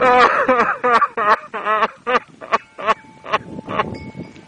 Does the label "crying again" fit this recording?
Yes